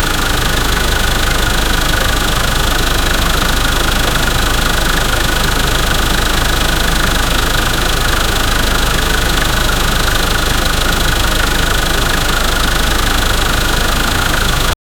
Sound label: f1 v6 hybrid engine; motorsport